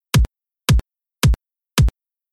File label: Audio Edit no XF Kick